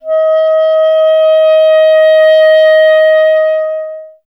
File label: clarinet D#; Miroslav Vitous; Solo Instruments VOL-1